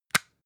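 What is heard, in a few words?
floss close compartment hard, Bathroom; haus-garten; badezimmer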